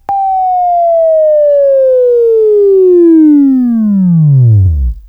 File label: Buzz; Labview-Program; Sweeping Training program